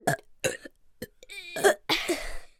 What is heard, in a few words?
human burps two children 5 and 7 forcing burps